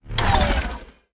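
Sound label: reload element; cstrike; valorant; rgx 11z pro vandal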